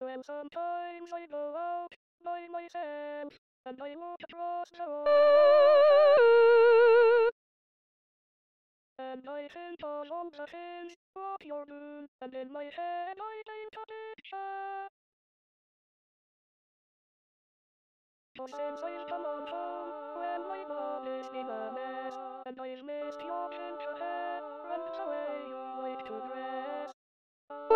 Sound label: Lead SATB Soprano